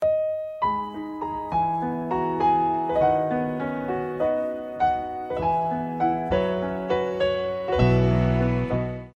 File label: message tone ringtone